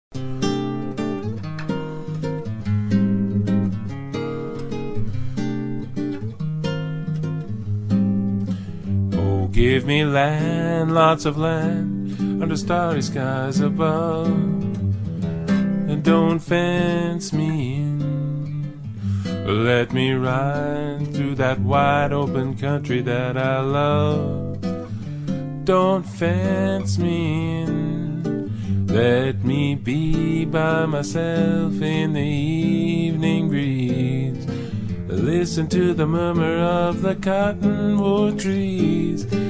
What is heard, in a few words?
Swingbossa